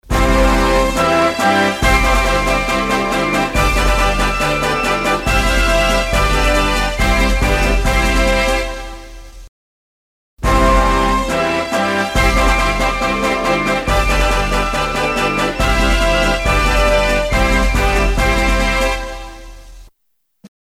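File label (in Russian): fanfare for an award ceremony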